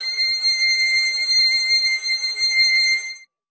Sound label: string acoustic, cello